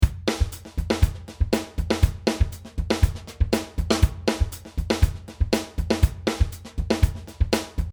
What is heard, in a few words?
Songo Fusion 120